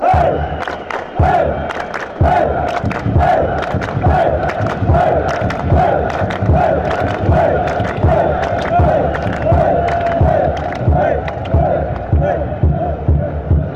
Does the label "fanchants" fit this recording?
Yes